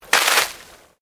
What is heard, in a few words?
Water Splash Concrete Medium; Elemental weather effects; Nature